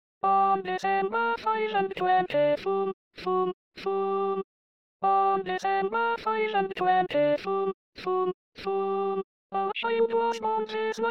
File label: SATB Alto